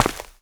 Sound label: Footstep Dirt; Guard